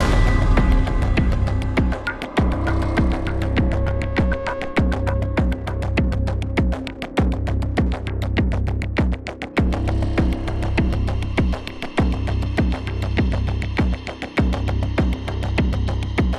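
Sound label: SYNTH PERCUSSION loop; gamerip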